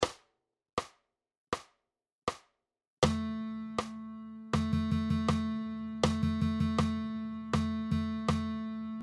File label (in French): Dictées de rythme, guitare